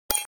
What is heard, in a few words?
HUD futuristic button selection